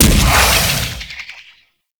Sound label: Fist Impact Flesh; Warframe; Mummy